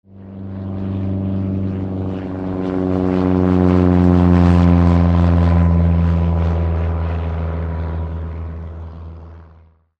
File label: flight